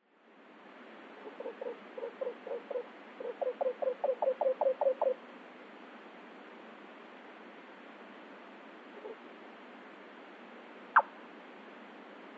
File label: yotaka, nikko